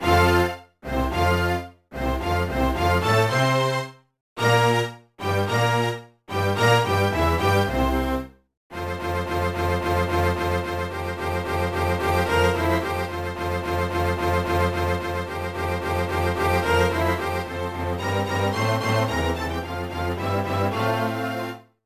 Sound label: BGM ORCHESTRA